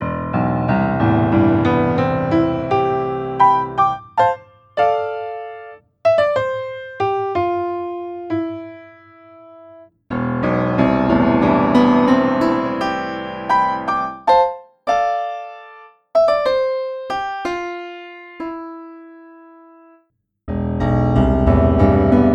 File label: Grand Piano Pop hard; EZKeys; PianoV